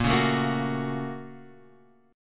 A sharp Minor Major 7th Sharp 5th, down, Guitar, Half Step Down